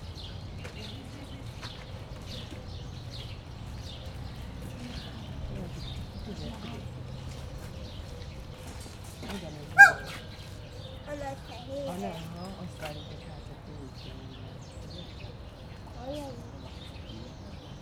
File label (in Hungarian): egyszer megszólal, anyuka gyermekével, páva; debreceni zoo 2012